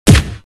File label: gun silencer; VAPEN; SKOTT - SKRAMMEL